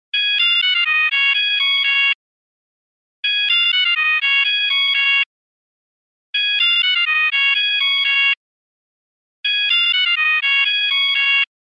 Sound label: Satellite Phone